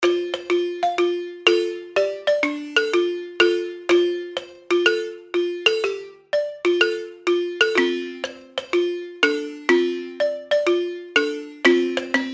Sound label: West Africa Balafon